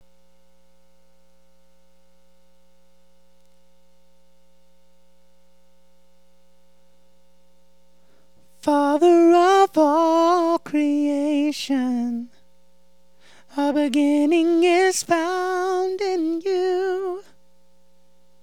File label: alto Recording